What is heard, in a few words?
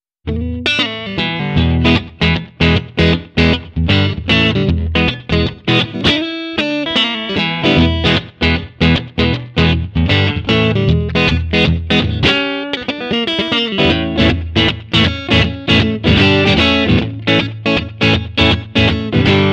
blues mode2